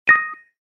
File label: Voice Ready; Samsung Galaxy Young GT-S6310N; Movistar